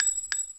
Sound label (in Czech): nábojnice; Mafie 1